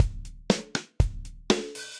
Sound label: Kit Old School, Session Drums Standard Kits; Ableton; Session Drums Studio